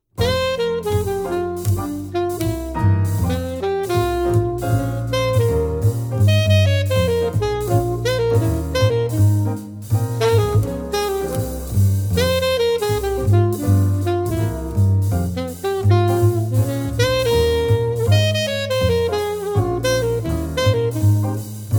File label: Piano Quartet